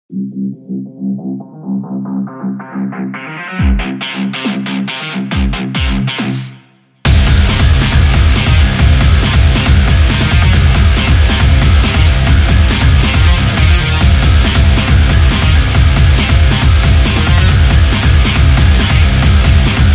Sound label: Powerful Action